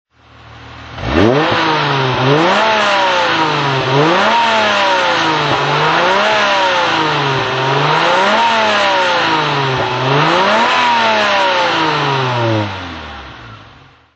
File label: alfa romeo sz throttle